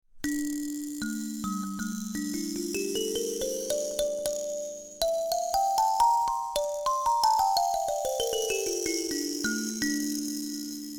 Air Karimba

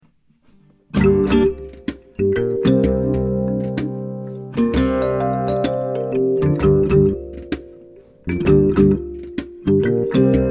G min Pentatonic, kalimba; Gmin Pentatonic FULL

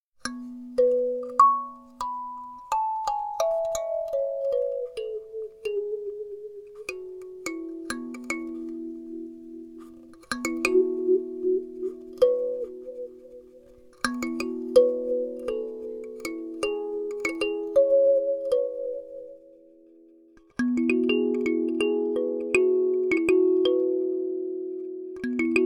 I Ching Box, kalimba

